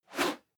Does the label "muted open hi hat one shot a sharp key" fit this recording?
No